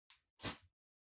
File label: Flint Stick Far Strike